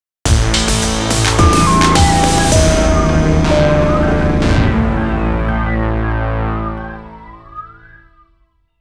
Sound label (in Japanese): ゲームオーバー; ge cen love original soundtrack 2012